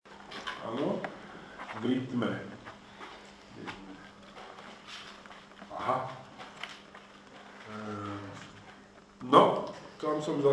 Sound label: Trio